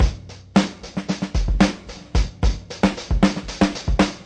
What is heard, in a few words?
112 fastlife groover